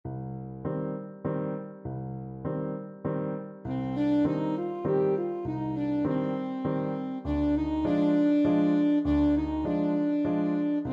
creak crunch scream, saxophone

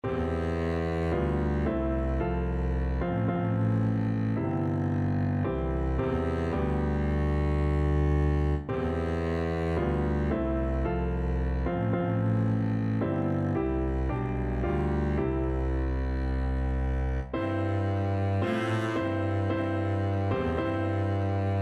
nearer my god, double bass

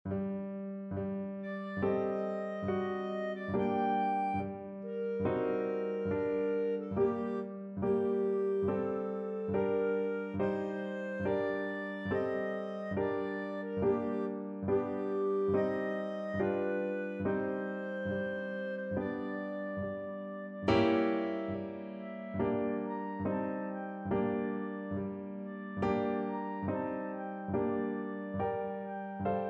evening bells, clarinet

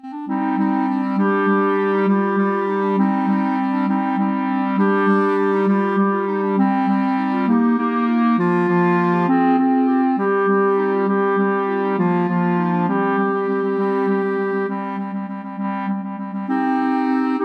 clarinet choir

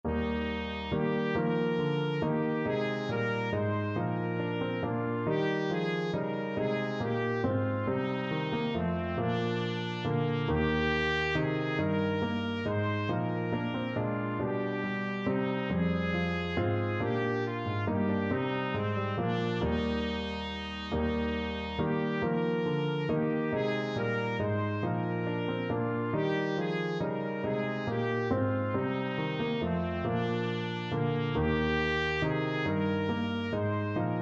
trumpet

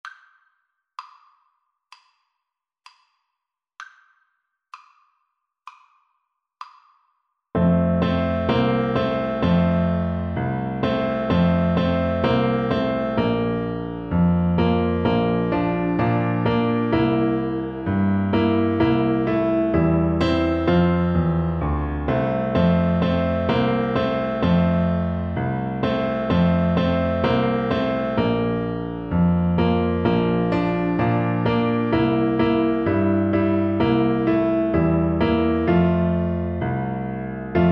jingle bells, Tuba

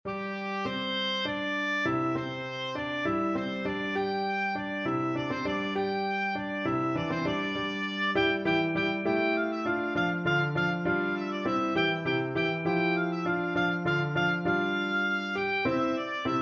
water music, oboe

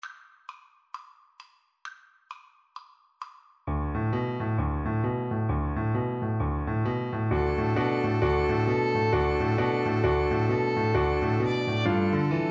jingle bells boogie

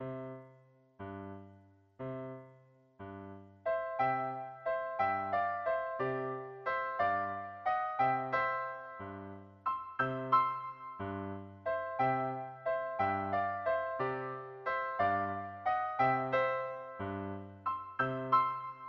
flute duet

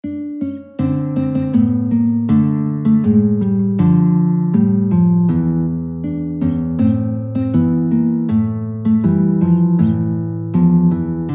Habanera, guitar; Carmen

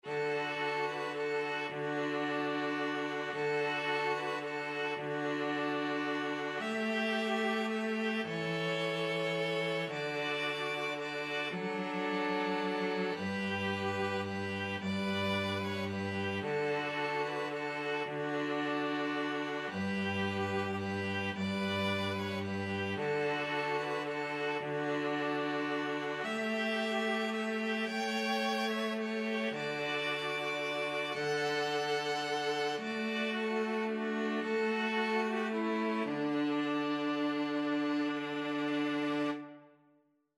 string trio

